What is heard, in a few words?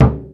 Muted Deep Drum; Digital Sound Factory Xtreme Lead